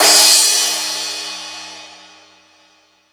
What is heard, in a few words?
Ambient Rock, Drum Machines